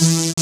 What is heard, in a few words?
SYN, DANCE SYNTH